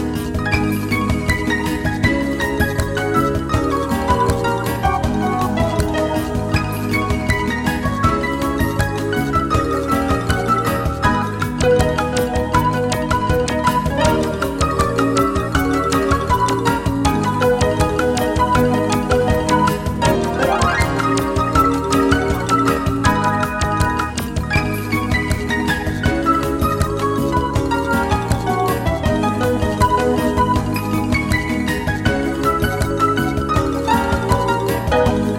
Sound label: Bossa Nova